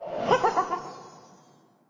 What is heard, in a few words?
Clicker game pumpkin reset